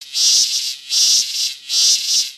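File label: Pets Insect Large Beg; creatures; Lego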